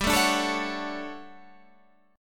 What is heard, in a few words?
D-Major 7th G; DM7/G strummed